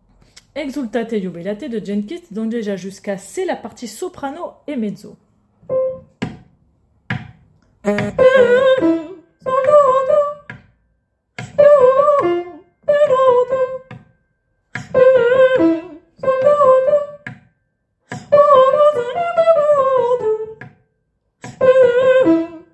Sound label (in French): Soprano et Mezzo